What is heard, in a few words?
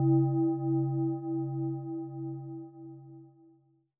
bell metal; amb; angband